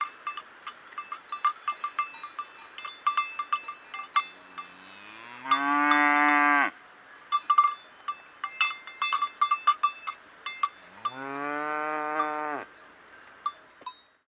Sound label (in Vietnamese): tieng chuong tren co con bo